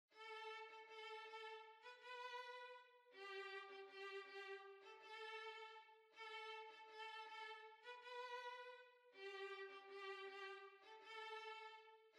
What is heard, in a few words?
Violin